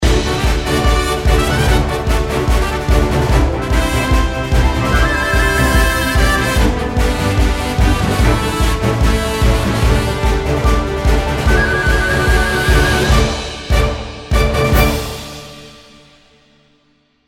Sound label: Casino Fanfare; Mist Train Girls